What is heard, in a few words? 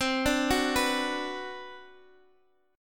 B Minor C; Bm/C arpeggiated; JGuitar